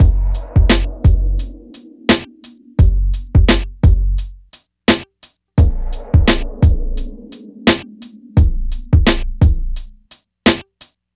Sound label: MIX, Hip Hop and R&B Drumloops